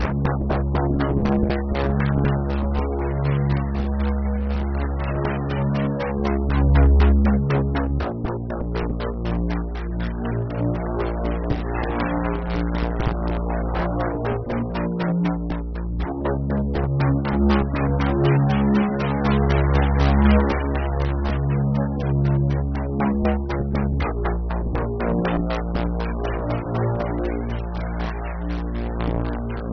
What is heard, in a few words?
loop dark thriller; quadplay